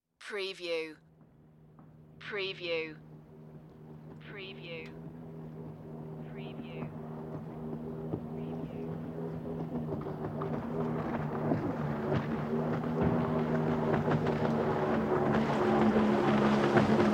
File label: Jet Ski Pass By Distant; Sound Effects Bible